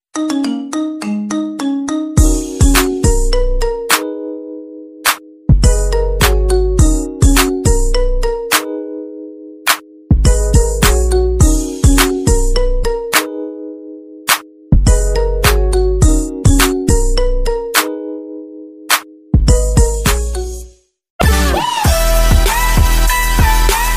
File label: marimba remix ringtone